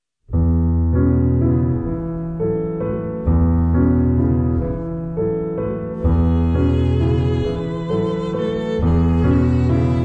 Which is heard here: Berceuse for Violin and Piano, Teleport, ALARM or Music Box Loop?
Berceuse for Violin and Piano